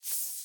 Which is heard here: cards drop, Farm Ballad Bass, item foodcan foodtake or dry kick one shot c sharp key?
cards drop